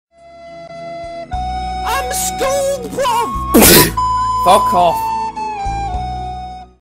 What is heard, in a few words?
sneeze